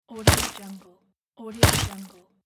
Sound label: bug stomp